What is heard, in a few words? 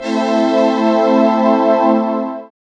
SYN SURFI, dance synth; Sound & Vision - Gigapack I CD 2